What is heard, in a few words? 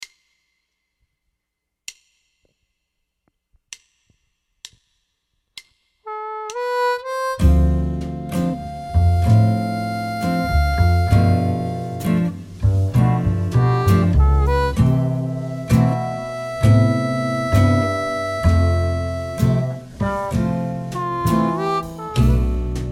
65 harp